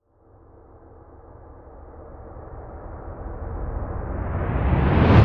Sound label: CRESCENDO META, Crescendo Metallic Ascend Accelerate; Rise And Dive Sound Effects